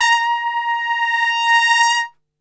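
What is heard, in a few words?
A#2 trumpet; Best Service; Brass Super Section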